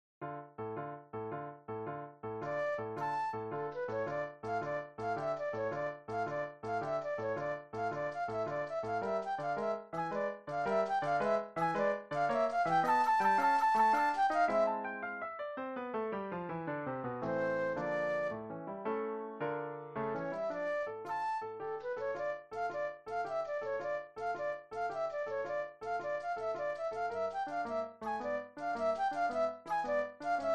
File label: Flute Solo; classical